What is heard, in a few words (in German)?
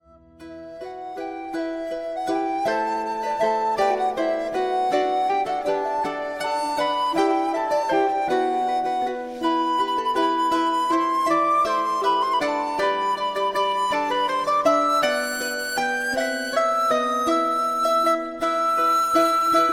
Renaissance Laute Floete slow